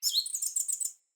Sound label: Bird Song; Josalfar Bird Guide